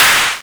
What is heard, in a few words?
Clap; Vengeance Samples; VEC1 Claps